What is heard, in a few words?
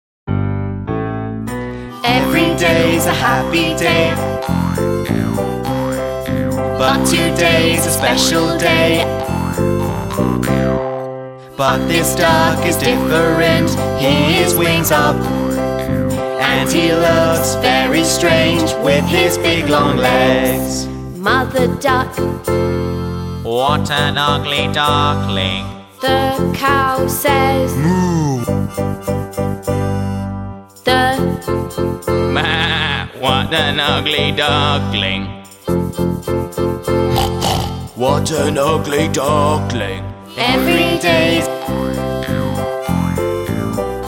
Karaoke Song